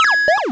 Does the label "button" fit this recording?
Yes